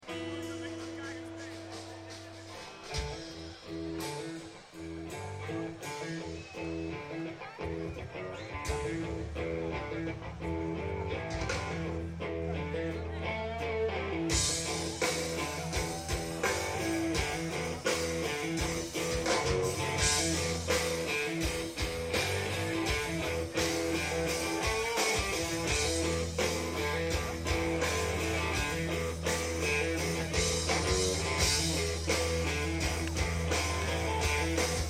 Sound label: chorus riff